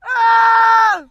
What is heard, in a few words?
Death Screams 6; 10 Male Screams, Various